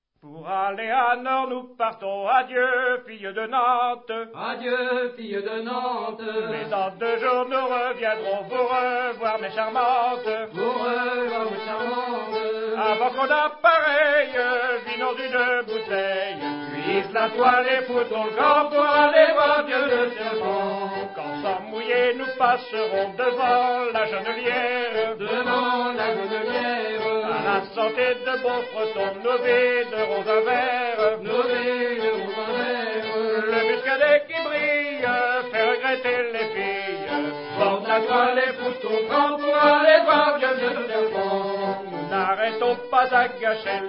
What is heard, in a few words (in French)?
Chant; Chants de Marins; Gueule de serpent; A Hisser